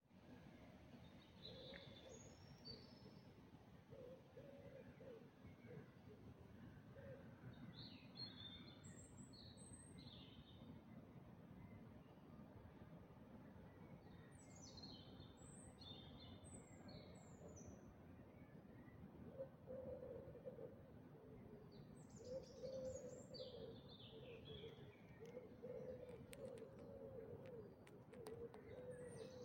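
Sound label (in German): Dawn Chorus, Vogelgesänge vor Sonnenaufgang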